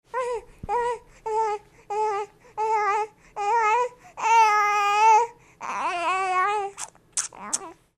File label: Aye Aye; frenzy